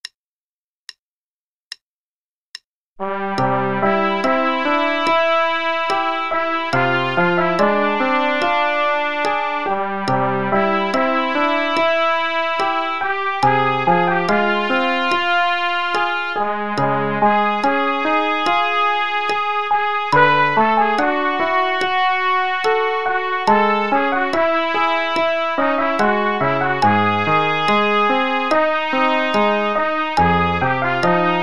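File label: with piano, quarter note=72; Play-a-long; trumpet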